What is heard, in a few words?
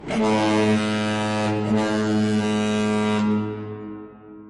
ship blast twice